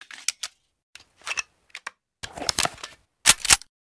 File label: colt reload; firefight